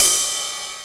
Dry Cym; EMU Xtreme-Lead-1

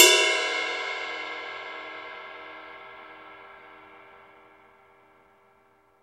Drum Dry; East Collexion; Drum 1

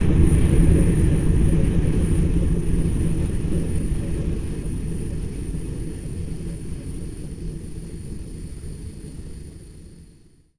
Gas Leak, Noisy; Zero-G Seismic Frequencies

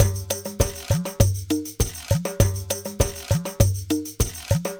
Ensemble, Latin Percussion

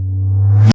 REV Dry Tom M

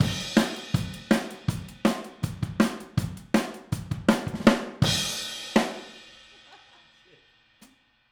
Outro Overhead ST, 162 BPM, Drums; Drums from the Big Room The Mixes; Take Your Toms Off

